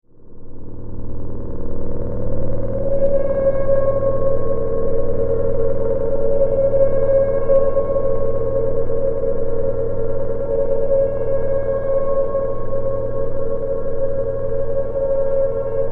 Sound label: Stab Synth High, Pitch, Ring, Science Fiction; 5.1